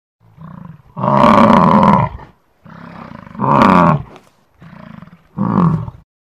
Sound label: American Bison Sound